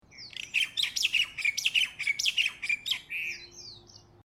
Honolulu Morning Bird Close Up Short; Quaint and pretty birdsong, twitter, tweet, bird chirping.; Bird Sound Effects

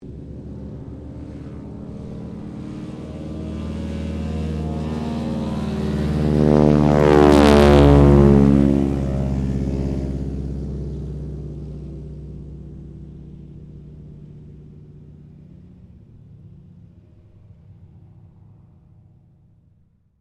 AIRCRAFT BOEING PT-17 STEARMAN TAKEOFF; PT-17 Stearman Trainer; Airplanes

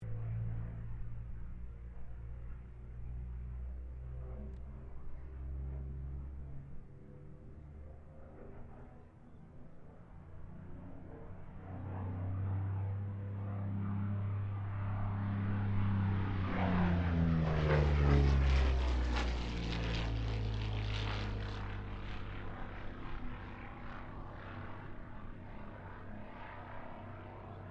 AIRCRAFT P-40 KITTYHAWK PASS STEREO

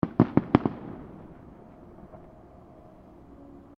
Fireworks Multiple Crackles; Fireworks (Sound Effects:Backgrounds)

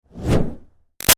Fire Torch Whoosh Med; Flames; Sound Effects:Objects; Big Room Sound

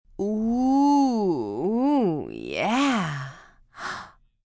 Ooooo Yeah Gasp, Female; Sex; VoiceBox

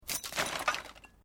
Metal Light Movement Minor

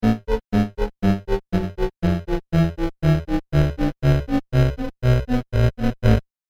Descending 8-Bit Steps; Video Game Sound Effects; electronic sound effect

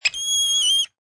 Spider Monkey Howl; Lynne Music

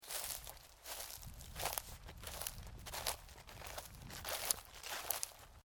Shoes: sneakers, walking on dry wheat; Footstep Sound Effects; Footsteps (Sound Effects:Human)

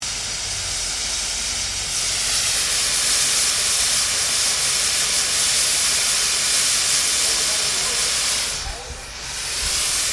Steamtrain Venting; Bellarine Tourist railway vehicles; Trains

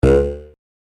Cartoon Boing; Boing Sound Effects; Andrew Fleming

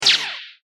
Blaster Pistol Single Zap, laser gun; star wars / trek style games; Andrew Fleming

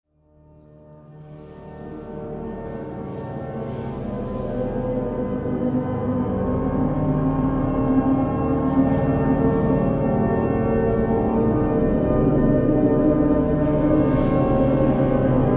Hymn; Circa1600; Singing

